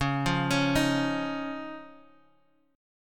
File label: C sharp Major Add 9th, Guitar, Half Step Down; C#add9